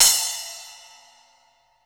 CYMB; Houseworx; Drums; Cymbal

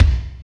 KICK, Tekno Industrial; East West

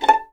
A5 PIZ MF, VLNS PIZZ; East West; The Ultimate String Collection

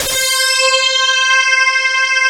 DS8 C 5, Korg; Back In Time Records; Korg Universe VOL-1

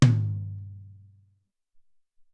Lo Tom, Alesis SR-18

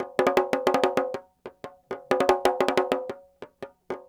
Petia, World Percussion

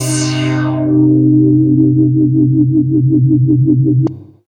HITS, Special FX Twisted; USB Soundscan; AKAI

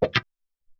Vox Hiss

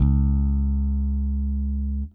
Whole Notes C#, Bass; Construction Kit; Bass X

